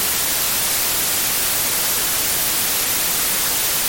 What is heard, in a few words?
white noise loud